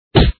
damage; tobby on ice

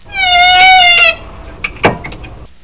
door c

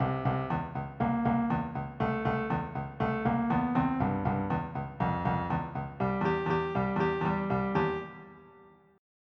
fill

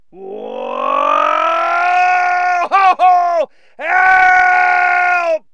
Distress Help!, Cartoon Yells

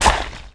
guts

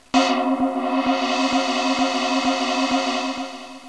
gong

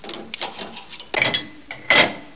GLASS

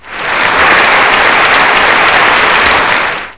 applause short; Spelling Made Easy

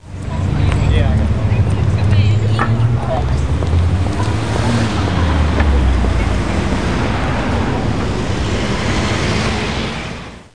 amb

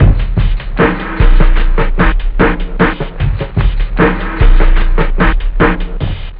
break, house; OctaMED SoundStudio V1

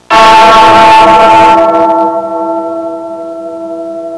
real loud bell

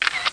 Camera; National Security Press Kit

